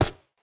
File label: MK2 SD shot; Zomboid; VFE